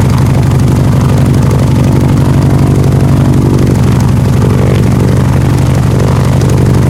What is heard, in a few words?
wildcat rear, engines; War Thunder